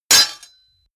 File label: sword parry metal